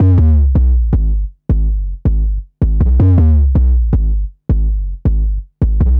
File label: Distorted Bass, Drum & Bass; Fluid Dynamics